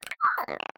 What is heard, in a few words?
headcrab purr; scripted sequences; hideout